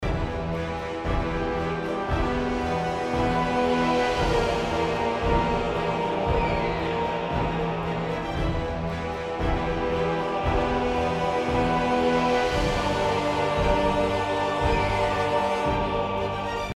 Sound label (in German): Mittelalter Dramatisch loop; Video Game Music